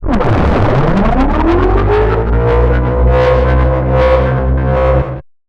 Alarm Riser

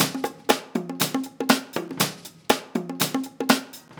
120 Underlay, Miami Bass; Zero-G; Soundclash Nu Dance Electro; Combi Loops; 120bpm; Miami Bass Machine

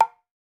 CLAY BONG, African Perc; E-MU Formula 4000 Series Vol. 4; Earth Tones

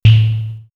Hollow Thud, synth fx; Concussion; X-Static Goldmine

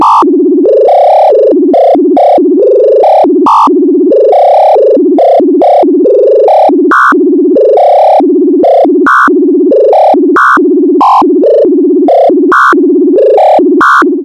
ARP 2600, SEQ; TECHNO-BOX

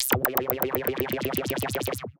C2, SynthLine; 90 s Sample CDs; NiceBeats; SynthLine VOL-14

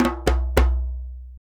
Conga Licks

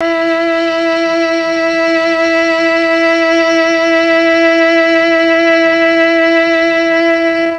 E4 TRON CELLO; Mike Pinder - Mellotron Archive CD

